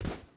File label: footstep rubber boots sand jump land; Rust